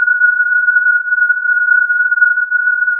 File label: filtered input